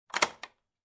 Door Barrel bolt lock click sound

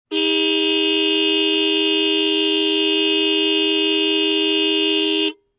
Long Motorcycle Honk

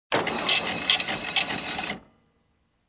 Receipt printing

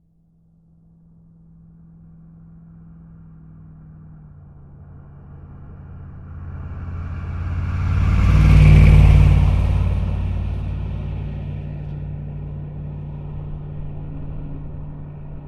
Pickup Truck Approaching and Passing by

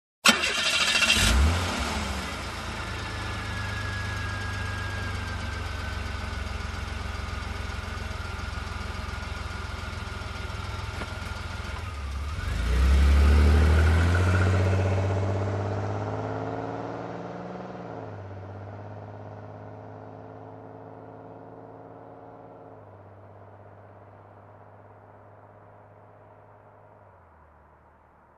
Muscle Car engine ignition and driving away

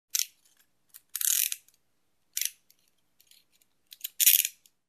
Handcuffs clicking and being locked

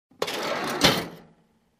Cash register drawer close

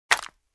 Empty plastic bottle smack

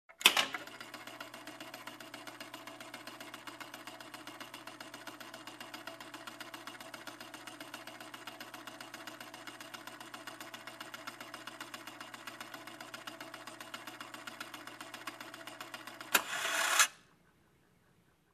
Clock gear rolling

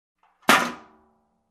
Tin Can Hit

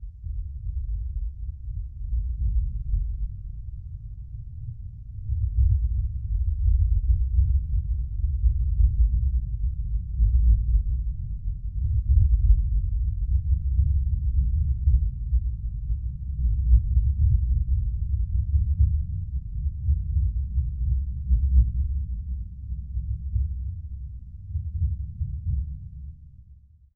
Distant explosions rumble

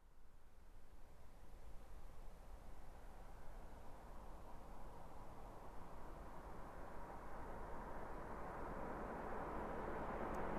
Car parking engine shutoff

